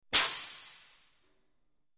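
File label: Respirator Air Exhale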